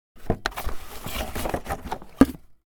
Objects office cardboard box scratching and crunching